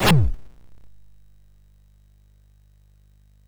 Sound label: Power of Black Bandit, MOS6581R2